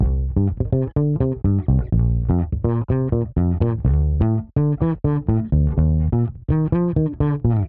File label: 125 Fenbass full; PowerFX - Nu Jazz House